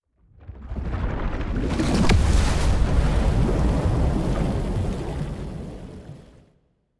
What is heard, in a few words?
colossus megastructure hit; aquatics